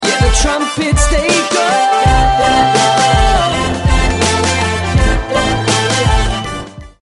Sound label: trumpets